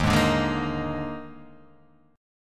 Eb Major 7th Suspended 4th Eb, Guitar, Half Step Down